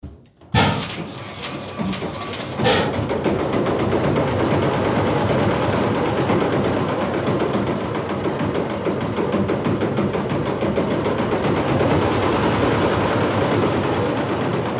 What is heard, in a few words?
Deutz engine